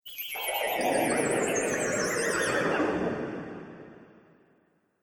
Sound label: Subtle Dimension Spell, magic; fiction sound effects